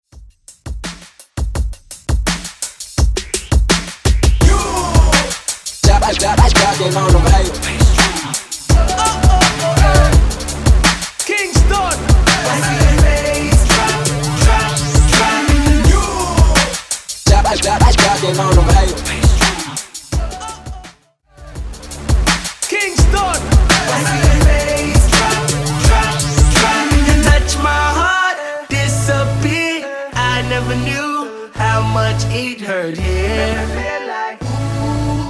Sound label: Throwback Redrum